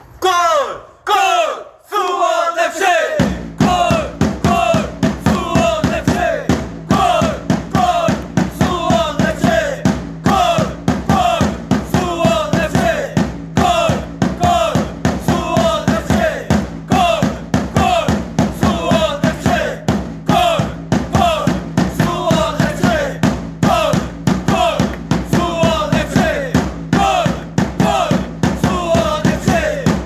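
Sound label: goal goal suwon fc, football chant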